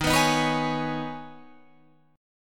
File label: D sharp 7th Suspended 4th Sharp 5th, Guitar, Open F; D#7sus4#5; strummed